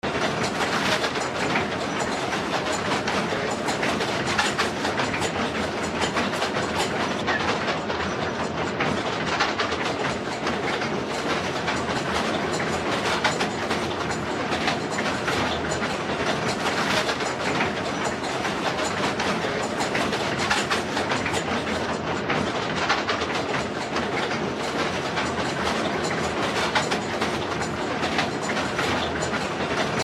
atmo rustic watermill wheel

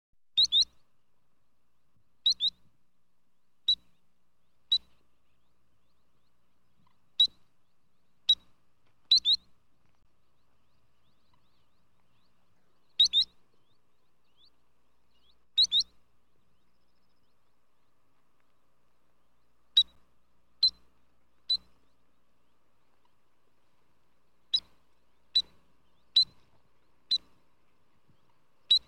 birds sandpiper

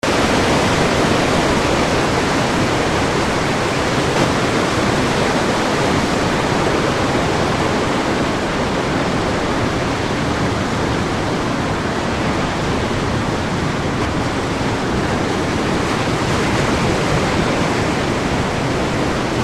harbor beach pier at sunset; pismo

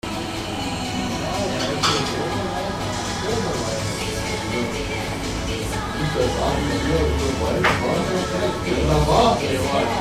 atmo germany berlin pub; pub of refuge

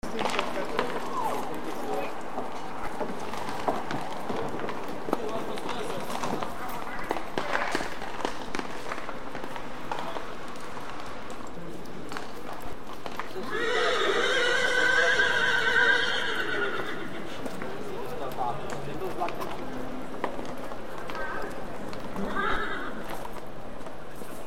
rustic old city

